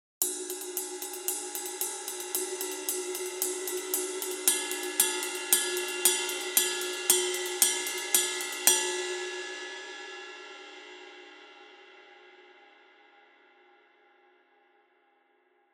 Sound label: Resonant Ride 20 Rock Extra Heavy